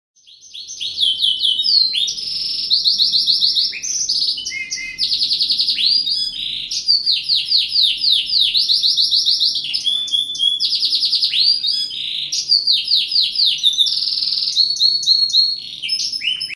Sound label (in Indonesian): kenari; Suara Kenari James Bond Versi 01; Burung Kicau